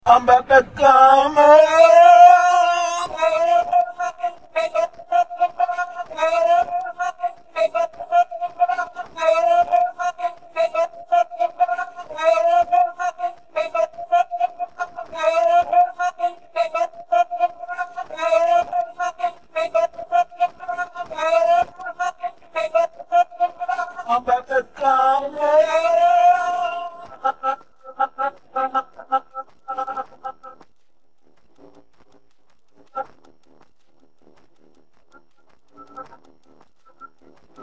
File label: phonk, vocals